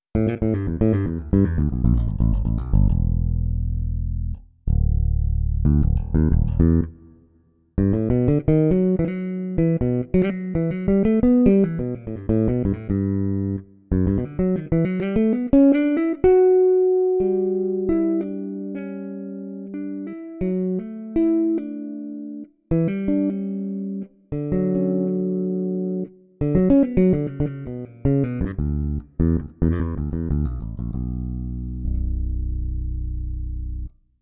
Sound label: just bass, electric bass